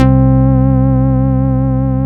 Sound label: SYN Strange PPG; Best Service Gigapack 1